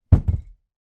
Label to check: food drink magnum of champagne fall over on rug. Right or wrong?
Right